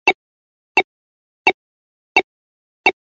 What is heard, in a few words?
beep computer chirping